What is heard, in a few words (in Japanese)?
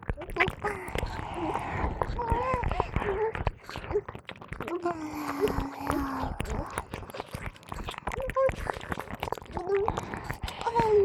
両耳舐め+イラマチオ; 小春